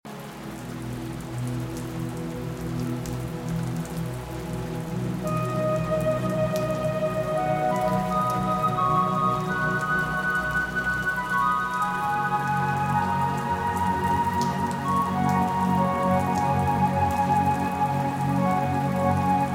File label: Celtic Sleep Music & Gentle Rain; Loopable